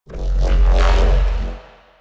neuro, bass

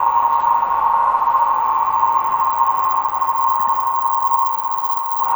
Drone Ambient; Drums & Drones

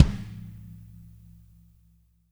AMB KIK; kick; Spectrasonics; Burning Grooves

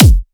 Kick C

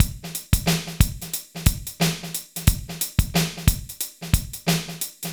BPM 90 AMB

